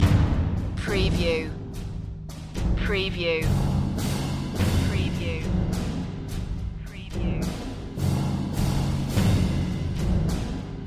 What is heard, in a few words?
prepare for the horde percussion loop